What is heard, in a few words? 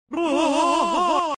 bwah